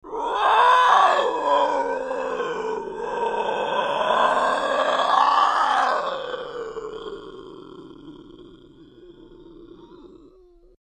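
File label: lion imitated by voice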